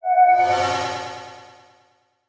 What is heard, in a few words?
Title Transition Delay Short